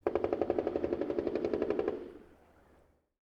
ambient shot mono; War Thunder

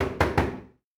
door knock metal friendly; Rust

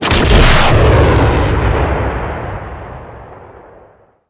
imperial rocket 1shot

